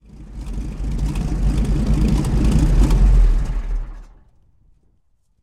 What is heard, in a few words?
cromwell engine stop